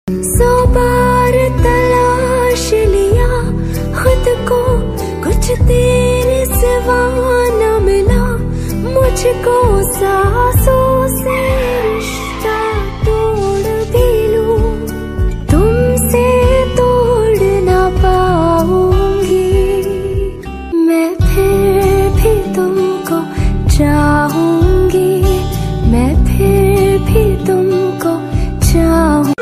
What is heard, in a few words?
Female Version Ringtone